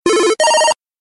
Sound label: Blackberry Cell Ringing